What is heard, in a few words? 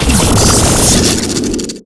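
RIOT SHIELD, WEAPON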